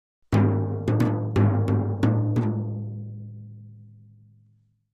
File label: Drums Toms B Accent